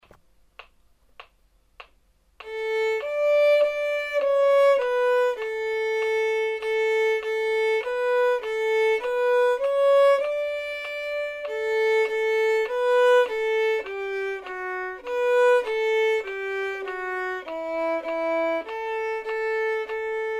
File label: vln prac